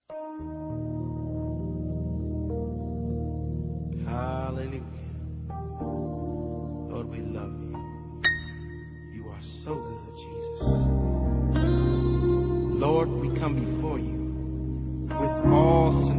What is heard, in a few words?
mass choir